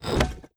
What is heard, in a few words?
monkey Place